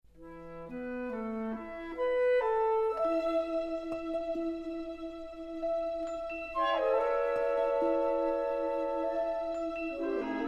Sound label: Music Creature